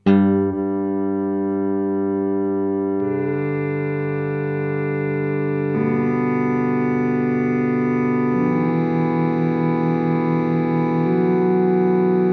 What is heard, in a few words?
EHX Pico Deep Freeze Latch Mode mit Layer